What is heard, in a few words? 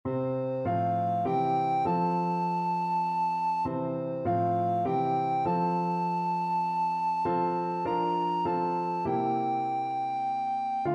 happy day, alto recorder